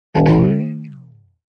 Drum Boing, Drum, boing, pitch bending, FX from human voice; Tech; VoiceBox